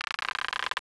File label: woodcreak